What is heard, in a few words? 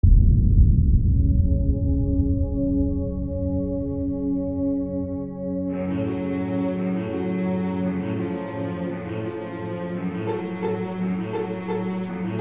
instrumental